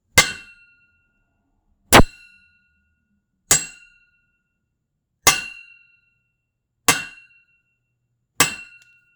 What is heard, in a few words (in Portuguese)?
batidas metálicas